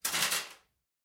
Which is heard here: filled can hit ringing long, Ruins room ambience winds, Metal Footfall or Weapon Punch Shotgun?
Metal Footfall